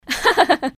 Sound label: Fake Laugh Fast